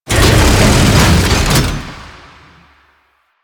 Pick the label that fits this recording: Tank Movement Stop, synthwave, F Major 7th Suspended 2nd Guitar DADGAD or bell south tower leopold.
Tank Movement Stop